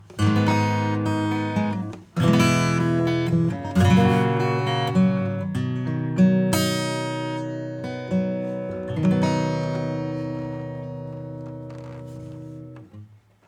Acoustic

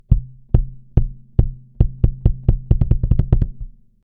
Kick; Gem Comp LA-2A

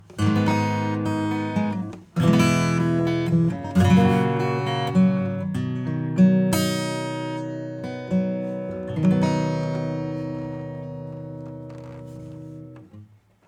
acoustic

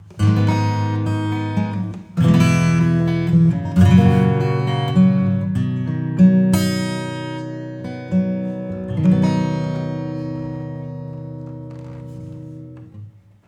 acoustic; smart reverb

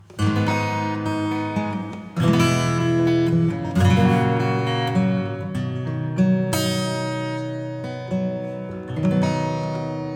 Acoustic default; Cinematic Rooms

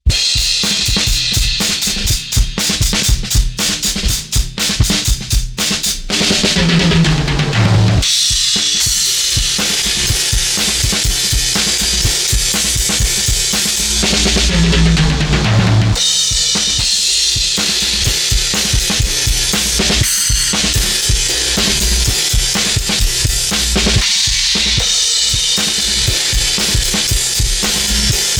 TAPE EQ High Mid Boost; TAUPE

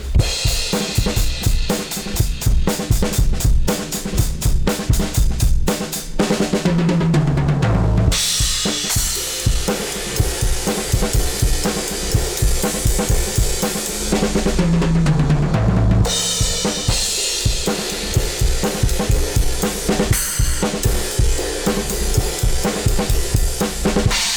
drums; Powerful as f

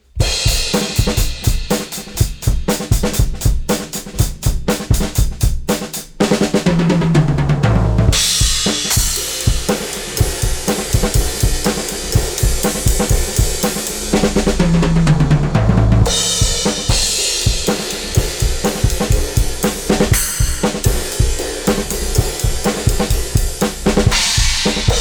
drums

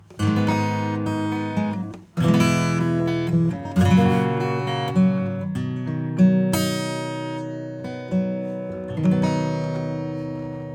Acoustic; Gainstation

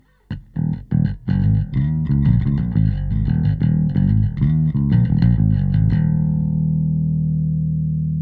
bass; ADC1 Compressor

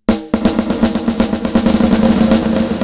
Delay DIFFUSION; Cluster

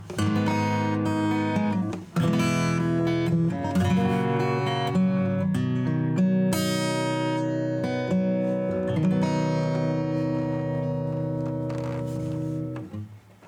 Acoustic Guitar, acoustic preset; Xtressor

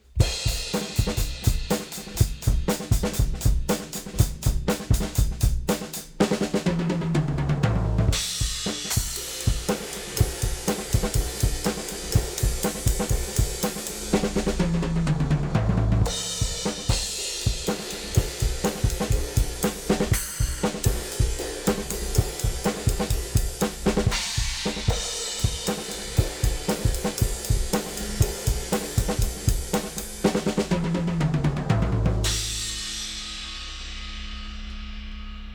Drum Bus; ADC1 Compressor; Sam Pura Drum Bus

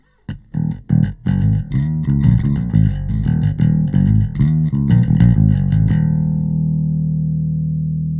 Bass

